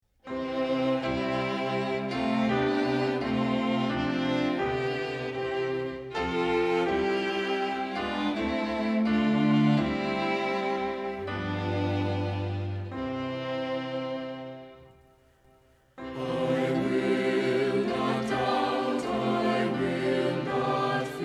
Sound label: vocal and instrumental, hymns; when faith endures